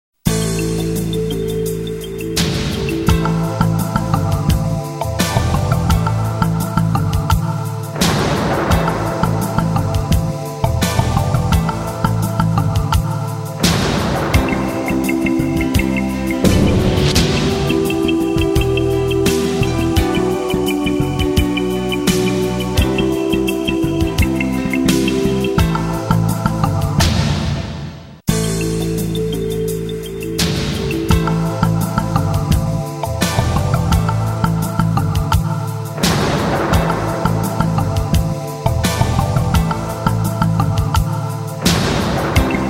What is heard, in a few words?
ELECTRO